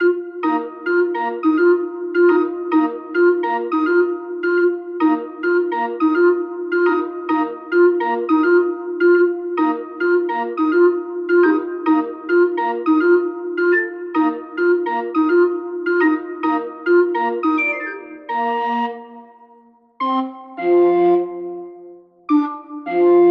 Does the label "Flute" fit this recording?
Yes